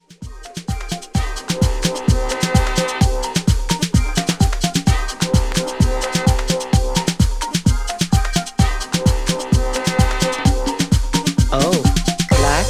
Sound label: Dub